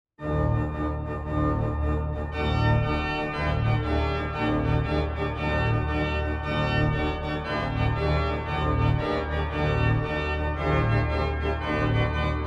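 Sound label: tambourin, organist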